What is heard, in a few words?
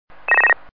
Bell Tone